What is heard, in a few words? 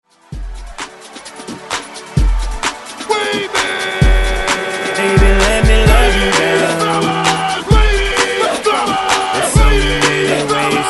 OMG Hype 130 Bpm; DJDOLLS